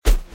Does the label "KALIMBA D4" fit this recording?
No